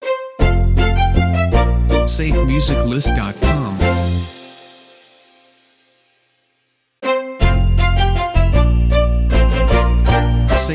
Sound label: We Wish You A Merry Christmas Short Intros